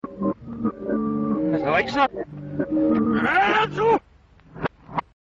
knockknock, reverse

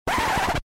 scratch

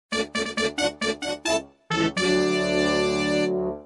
race fanfare

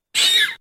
wilhelm

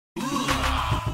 HOO-UGH

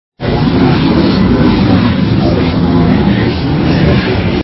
crowd

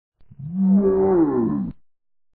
WOAH

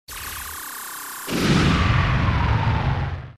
bomb

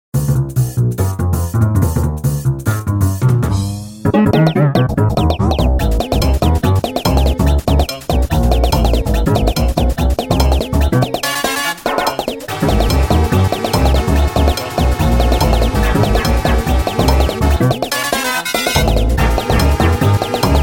dogbass

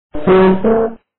toot; vapesquared

